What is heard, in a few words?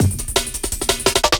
Jungle, Breakbeats